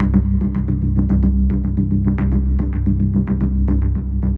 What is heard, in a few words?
Bass solo; Бас сэмпл; Downbeat Electronica; Bassolo